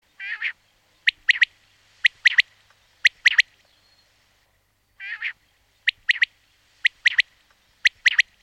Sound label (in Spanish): Codorniz